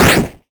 Hit 8 Bit

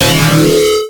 Screech

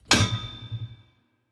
Single Iron Hit Hard

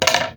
Bones

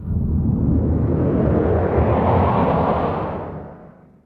Female mangle crazy rev

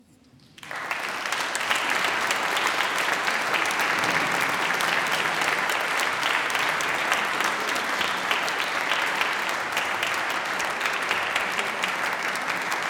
Audience Clapping

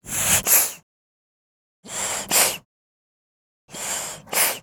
Air Pump Manual

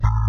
Low Beep

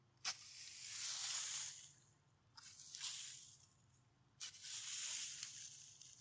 Raking Dirt

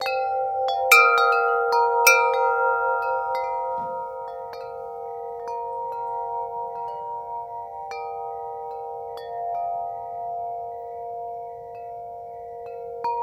Garden Chimes

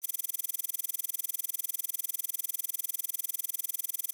Ui data On Screen Display

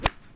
Golf

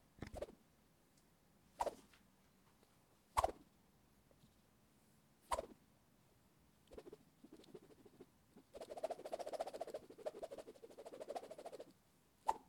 Swing Swoosh Stick Karate

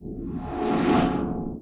Sci Fi Swoosh

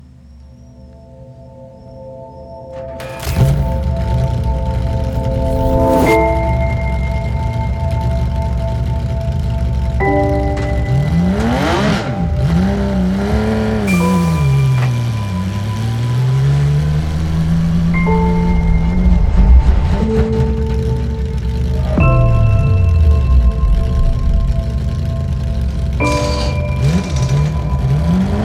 Cinematic Piano Trailer Score

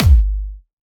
Layered Clubby Kicks

